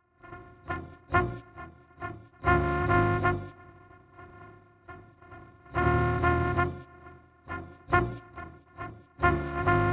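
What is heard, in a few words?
Polyfonic signal separated; Separation of Sound Sources by Convolutive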